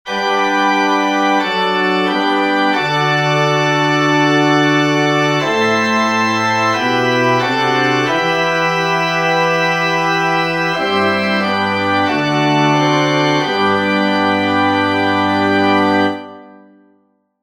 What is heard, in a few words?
Music